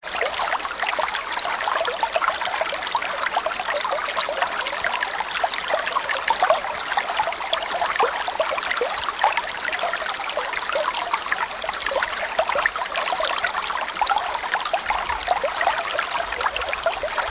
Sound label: water